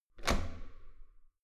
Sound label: metal door heavy handle down hard